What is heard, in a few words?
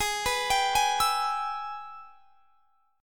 Minor Major 7th Sharp 5th; G#mM7#5 arpeggiated; Gsharp; x,23,21,24,21,24